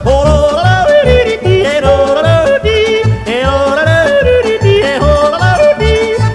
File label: yodel